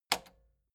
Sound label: metal button spring click slow